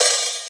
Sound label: open high hat g sharp key; open hat samples